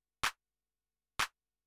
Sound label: CLAP HOUSE 125; eJay; Drum